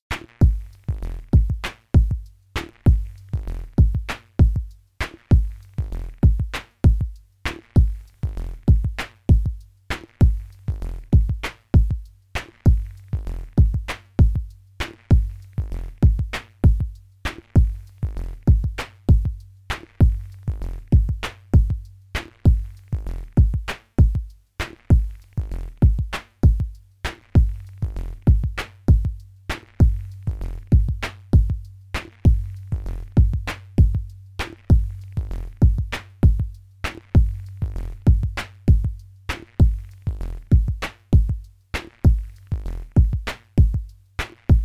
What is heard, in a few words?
Microtonic Pattern; Sonic Charge